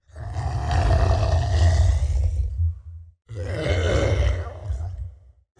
enemies bloater sound idle

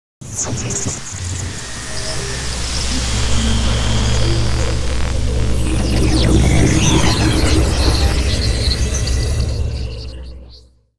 protoss unit warp in; starcraft2

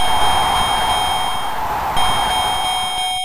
ding ding; punchout; arms

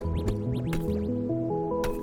celeste sound walking stones

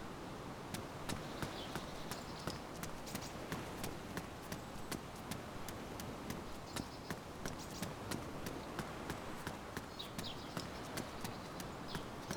walking asphalt; dayz standalone

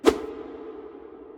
hit clean; hollow knight

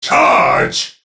charge; Dawn of War II

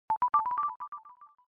map level select; baba is you

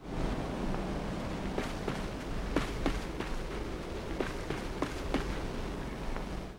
wood platform; limbo; perception

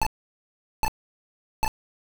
points end of level; castlevania

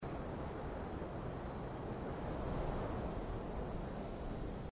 ambient; risk of rain 2

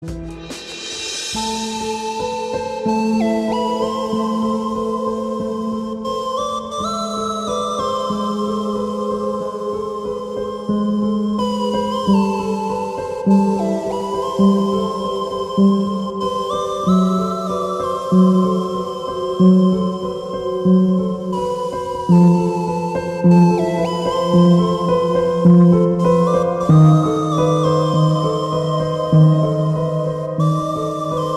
resurrection loop; celeste

